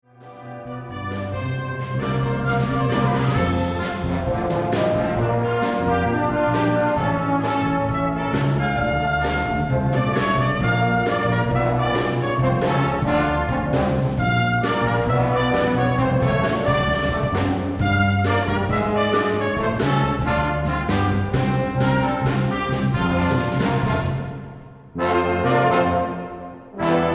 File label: Gospel Brass